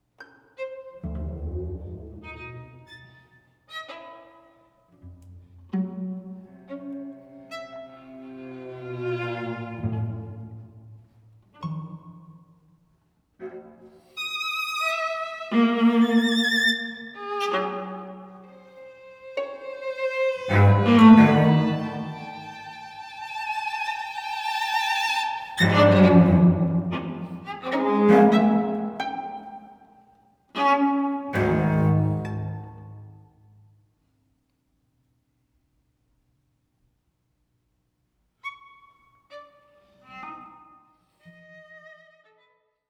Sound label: String Quartet, Misterioso